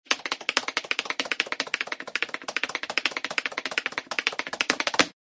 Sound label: tap dance intro very fast